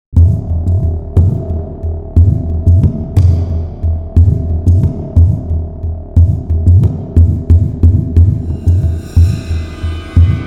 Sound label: Ambient Sfx